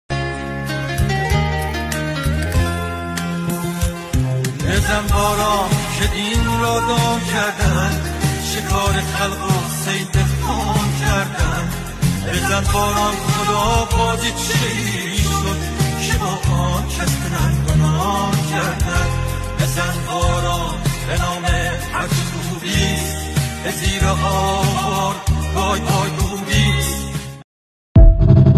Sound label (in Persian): music, آهنگ کوتاه غمگین ایرانی